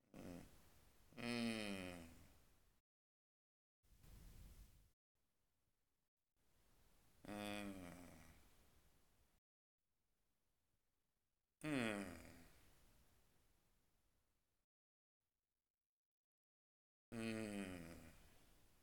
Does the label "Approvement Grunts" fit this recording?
Yes